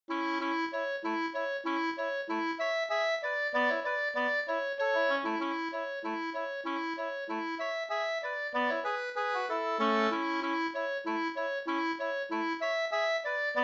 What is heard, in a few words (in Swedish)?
polka, 2st